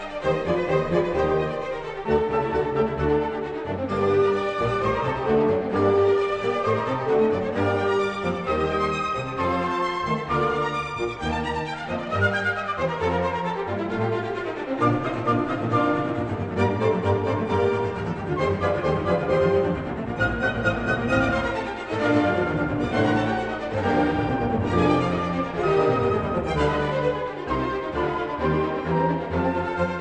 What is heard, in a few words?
classical